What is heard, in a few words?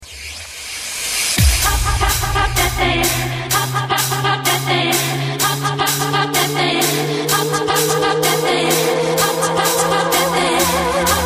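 Funky, ringtones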